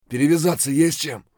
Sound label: bear low hp; eft